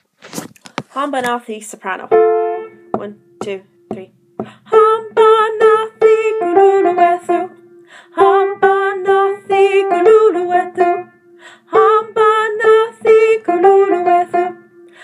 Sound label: Soprano; Hambanathi